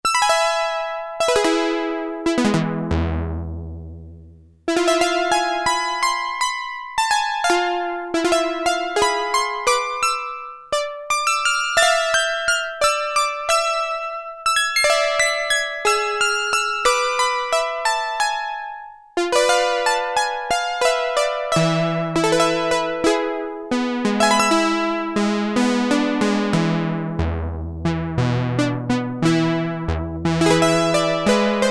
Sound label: Koto, Korg PolySix; Factory Presets Bank B